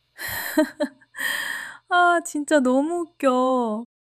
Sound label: laughter; monologue